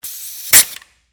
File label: Air Hose Release, Industry, Air, Hose, Release, Tool; Air Pumps; Machines; BlastWave